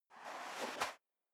step high heels sand scuff; schritte

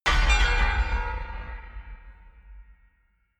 metal horror clang, metall; industrie wirtschaft

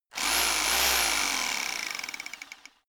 rock drill chisel burst rev up; schrauben drehen hydraulik

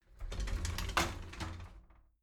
shower slide door inside open soft halfway

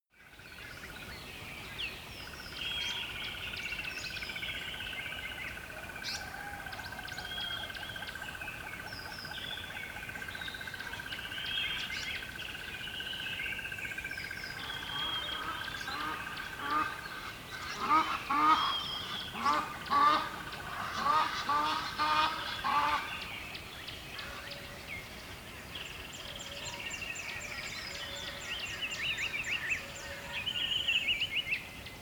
river dawn single bird, dschungel regenwald; atmosphaeren umwelt

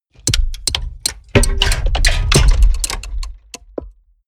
mechanics large movement; industrie-wirtschaft; mechanik

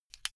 car flip key insert

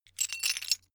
metal pieces clatter short

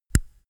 microphone pop off; objekte

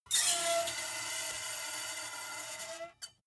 metal screech phaser steady short

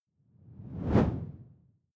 whoosh simple low; swooshes-swishes

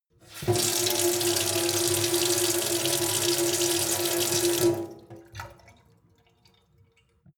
sink water flow medium

